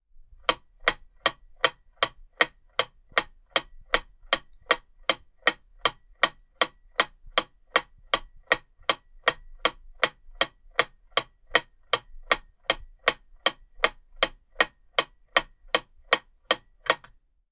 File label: Metronome 168bpm